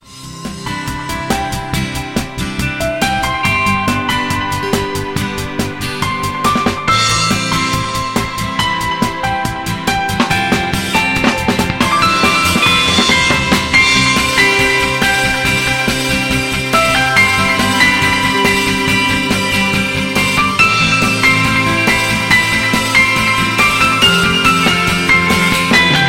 oppo ringtone

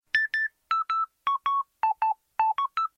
Beep

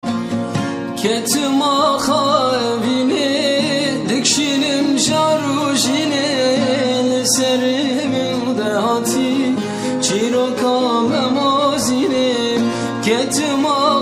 kurdish

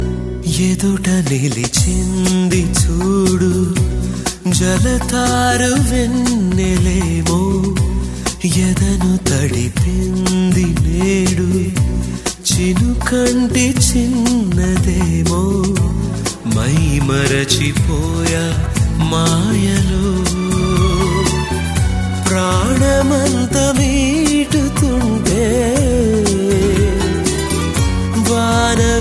Nice Melody

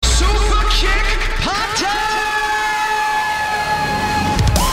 kick party

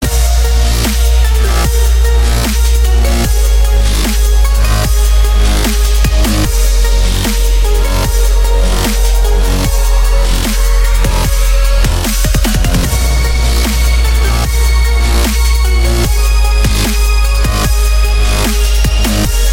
Melodic Dubstep

